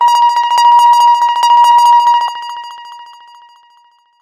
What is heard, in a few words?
Ringer